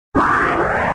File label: wall sound; aztec gold